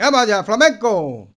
Flmnco M Vox; Roland BK-5

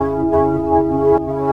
Chords Tones; Equipped Music; Loungin House

